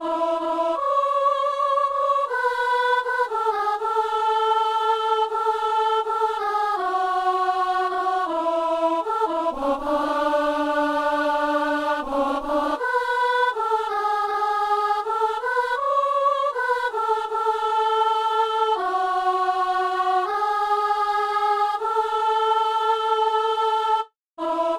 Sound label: soprano; Messe du 17 janvier à Saint-Denis